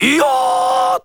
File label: SE GAME SLIDE; maimai